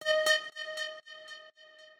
Lead Hit, Dancefloor Pop; Remixlive; FreePacks

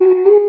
Vocal Hit, Dancefloor Pop; Remixlive

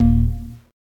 Bass Retro Hit, Dancefloor Pop; Remixlive

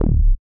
Bass Crisp Hit; Remixlive; Dancefloor Pop

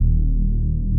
Bass Hit, Dancefloor Pop; Remixlive

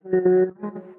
Vocal Hit, Dancefloor Pop; Remixlive

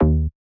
Bass Hit; Remixlive; Dancefloor Pop

